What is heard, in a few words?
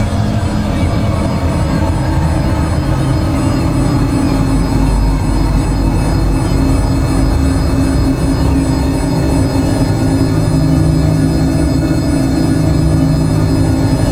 creeps; Radiant Crusade VR